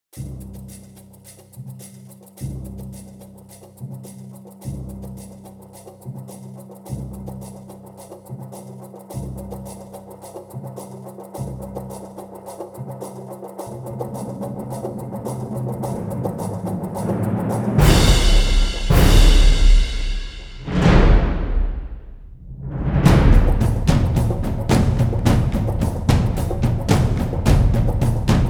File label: drums build